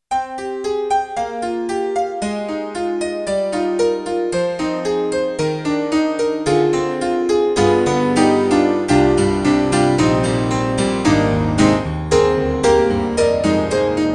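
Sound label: YAMAHA MOTIF-RACK ES Tacky, piano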